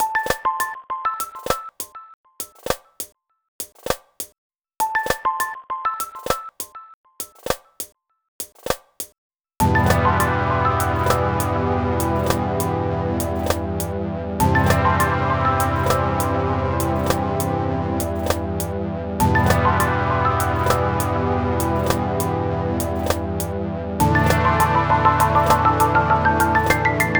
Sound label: Short Game Riff; Renoise